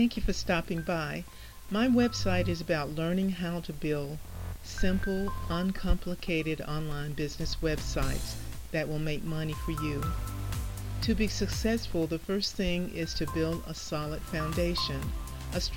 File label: welcome music